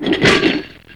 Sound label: flail; wesnoth